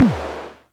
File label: Music Percussion Computer Tom, Drum Machines